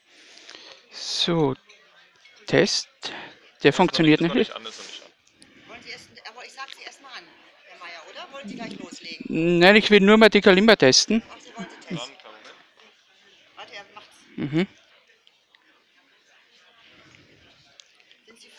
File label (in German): Kalimba Test Headset; SightCity-Forum 2017